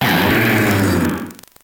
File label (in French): Cri